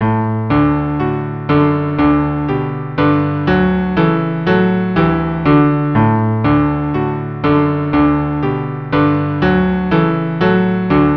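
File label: cello piano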